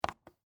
Game Piece On, Sports, Board, Game, Plastic, Piece, Place, On, Game, Board, Hotel; Monopoly